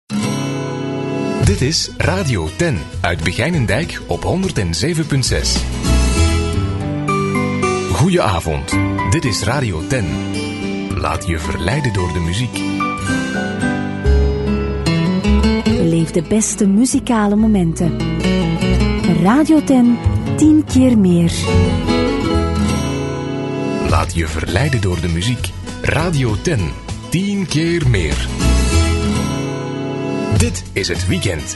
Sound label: jingles; Ten Begijnendijk